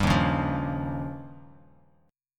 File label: Eb 9th, Bass Standard; strummed; 15,6,11,10; JGuitar